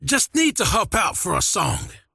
teleport pain; dota; largo

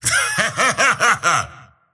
laugh; slyrak; dota; dragon knight

